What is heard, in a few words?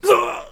Hit